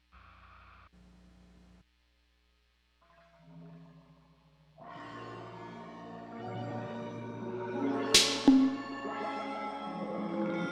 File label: Picking